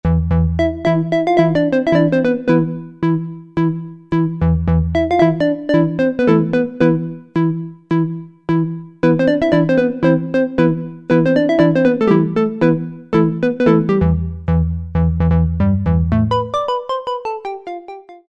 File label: Percussion, Cheetah MS6